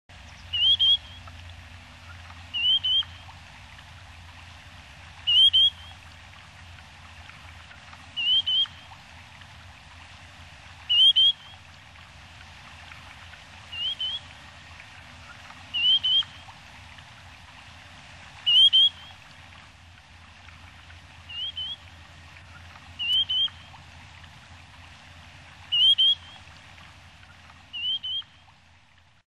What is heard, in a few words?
Heteroscelus brevipes; Sakhalin; Kuril; sea; priroda sakhalina i kuril